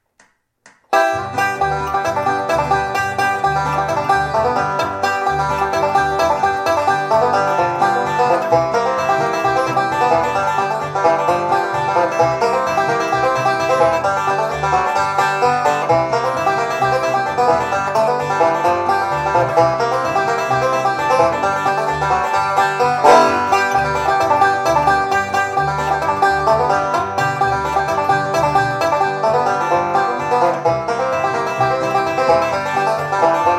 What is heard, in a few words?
pick along, banjo